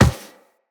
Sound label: Walk Metal, Footsteps; Operator